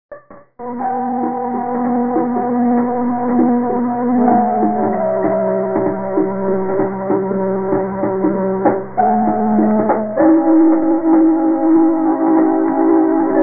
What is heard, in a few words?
piano